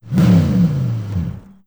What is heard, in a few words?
vehicle move